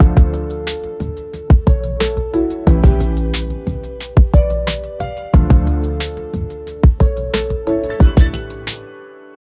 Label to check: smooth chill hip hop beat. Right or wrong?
Right